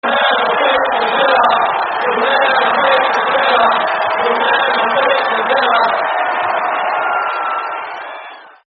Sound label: Never Beat The Villa No Cheer; Aston Villa; terrace chants